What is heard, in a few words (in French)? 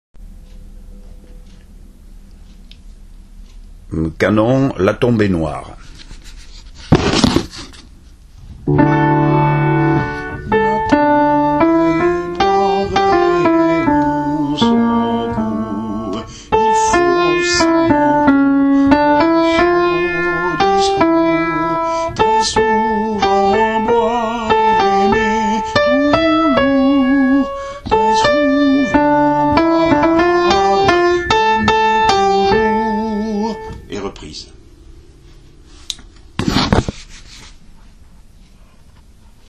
Adagio la tombe est noire canon